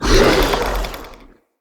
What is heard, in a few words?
Attack, Vocals; Enemy; Corrupted Sproutfolk